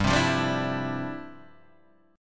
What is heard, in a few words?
G 13th Suspended 4th, G13sus chord; G13sus strummed